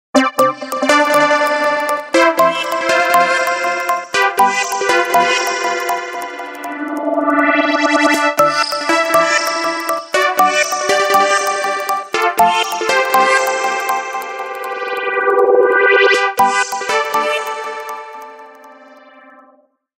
Blue Pause, Nokia Tones

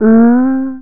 ooh; ATS-MA2

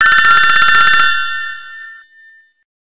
Classical Calling, ringtones; Fujitsu Arrows-NX-F-01F

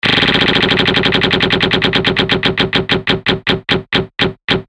roulette

